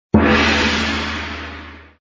Gong; kickin it; bobby wasabi academy

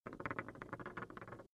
rolling loop; toystory; rex

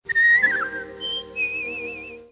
forest birdsong; snow white

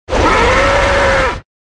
roar; cars; tractor tip; frank

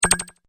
interface event; Wreck-It Ralph

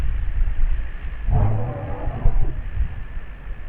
elephant chuff; calls; Elephant Vocal Repertoire

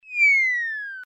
whistle; disney; mashup

